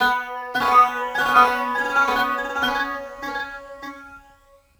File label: Phased Khol; Strings; Sonic Foundry (Sony Creative Software); Myths of Technology